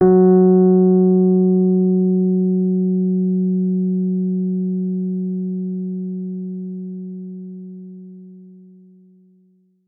Rhodes forte f#3; Best Service ProSamples vol.42 - Session Instruments

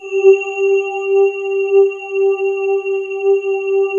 SYNCHR C4; Rubber Chicken Software Sample Bank

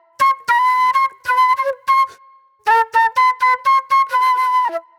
Flute

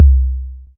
808 Kick; Novation DrumstationV1

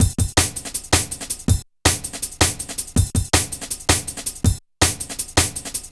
Re Group, Drum Loops, Jungle, 162; Jungle Warfare 1&2

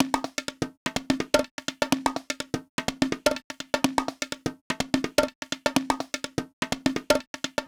Conga Loop 125; Equipped Music; Loungin House; Perc Loops 125

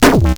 grind, EMU XL-7

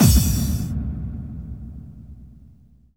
BD-FX, Dance Mega Drums; Best Service

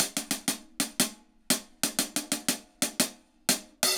HAT, HI HAT LOOPS, Dub; Drumdrops In Dub VOL-1

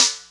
Snare, Ensoniq ASR-X

